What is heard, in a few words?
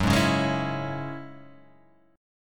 F sharp 7th Suspended 2nd, Guitar, Half Step Down